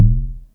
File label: SYNCUSSION